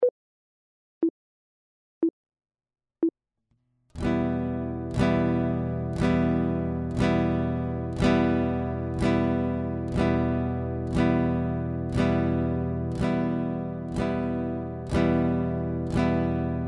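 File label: chord, guitar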